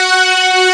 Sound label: P5 STRINGS; E-MU Formula 4000 Series Vol. 5; Protozoa